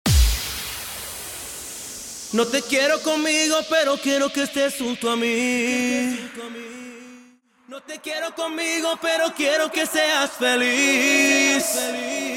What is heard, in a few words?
Acapella Starter 90BPM